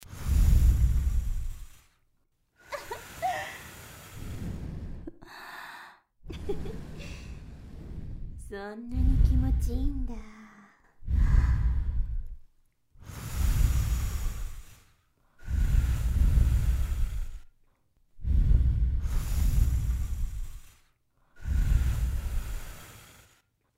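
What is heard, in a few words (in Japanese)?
立体音響（閉鎖空間）フーフー音声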